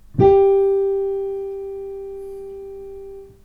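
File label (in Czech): piano d dur, D major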